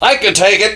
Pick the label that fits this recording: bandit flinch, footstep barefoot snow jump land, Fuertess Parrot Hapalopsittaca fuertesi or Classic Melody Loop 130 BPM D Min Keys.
bandit flinch